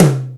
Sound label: KGT1, Korg-T1, Drums